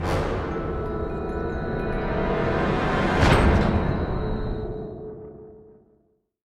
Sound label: UI Quest list; Preta Vendetta Rising